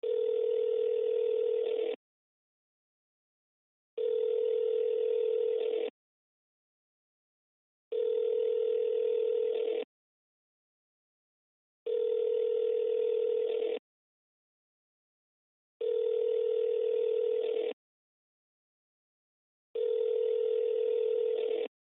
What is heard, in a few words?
Cell Call Ring, Cell Telephone Ring Call Receiver Loop; Cell Phones; Blastwave